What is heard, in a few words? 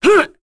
Vox Attack; kingsraid; voices heroes en